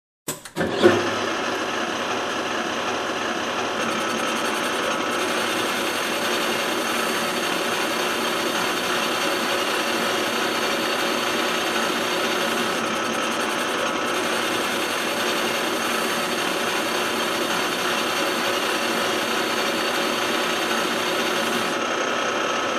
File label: Sharpening Machine up-close